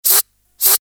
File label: ducky monster